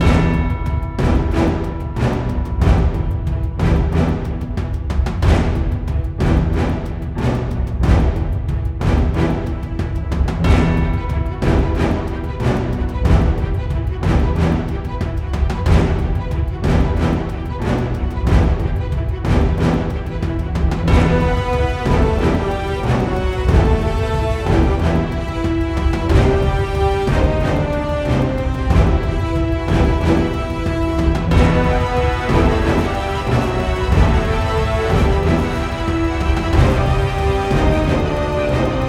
Battle Loop; Music; Boss Room; HighGroundRoyaleNetcode

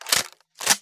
Shotgun Load; HapticSamples; Weapons; Feel; NiceVibrations

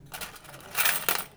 Cash; HapticSamples; Objects; Feel; NiceVibrations

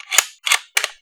Tactical Reload; Feel; FeelDemos

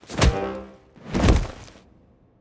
fall with Impact; Character; HighGroundRoyaleNetcode

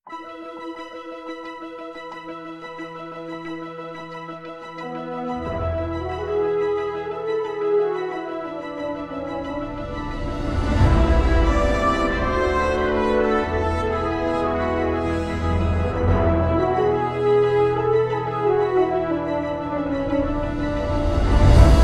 Boss Room Theme Loop; HighGroundRoyaleNetcode

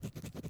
Pen, Haptic Samples, Objects; Feel; NiceVibrations

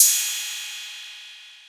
Sequencing Cymbal; Kit; Feel; MMFeedbacks; SequencingDemo

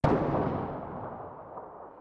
Bullet Crack; HapticSamples; Feel; NiceVibrations

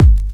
Drum; Feel; Feel Demos; Snake

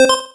Beep; Feel; NiceVibrations; Haptic Samples; ApplicationUX

